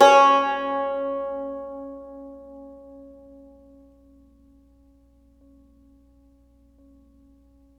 CUMBUS C#3

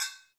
Brzl Agogo; Club-50 - Foundations Roland

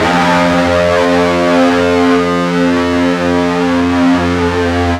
FUZZ F 1, Guitar; East West; Steve Stevens Guitar Samples Collection

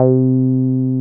303 OW C4P, 303 OW BS; Drums In Your FACE!

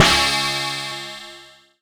C#, BELL GONG, Synclav FM; Big Fish Audio

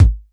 KICK DEEP; Crypton - DrumBase5000

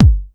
909 kick; HOUSE; Crypton - DrumBase5000; HOUSE #1

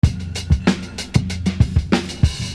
Beat, Hip-Hop Street Beats

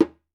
WALK DRUM, African Drums

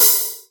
Rock Hihat, Alesis HR16B

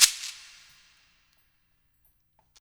SHEK WET; InVision Interactive; Percussion Slam; KWICKIE

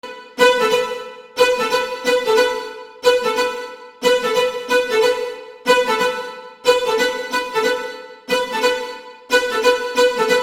String; Sonic Foundry (Sony Creative Software); Infinite Soul VOL-1

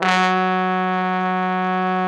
TROMBO; Trombone

